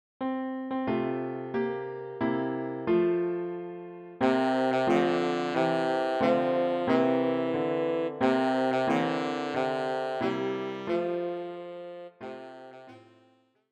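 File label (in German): Happy Birthday Tenorsaxophon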